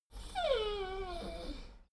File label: dogo argentino whine medium; natur-tiere; hunde